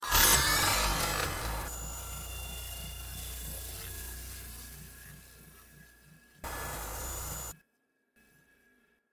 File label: Teleport